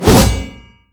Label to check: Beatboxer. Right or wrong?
Wrong